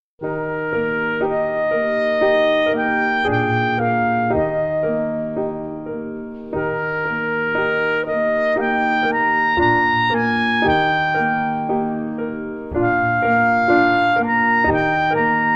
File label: Classical Clarinet